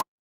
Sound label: cartoon pop